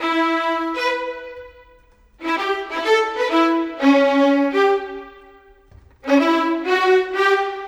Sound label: Violins; Sonic Foundry (Sony Creative Software); Orchestral Series VOL-4; Rock and Pop; Rock-Pop 19